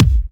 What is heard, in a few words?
Kick, Drum Hits; Trance; Zero-G Trance Inducer; 90 s Sample CDs